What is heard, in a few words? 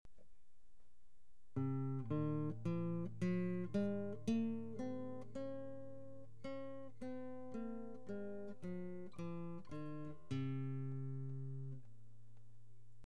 scale, classical guitar